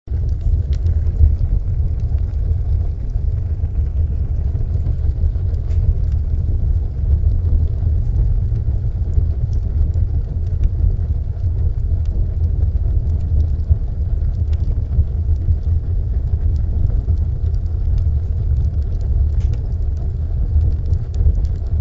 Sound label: Fire